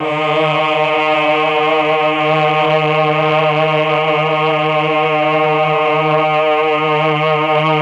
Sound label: CHOR; ILIO; Propeller Island Legendary M400